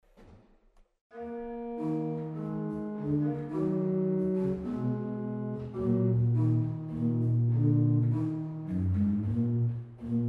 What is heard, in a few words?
Octaven Bass 8; waltershausen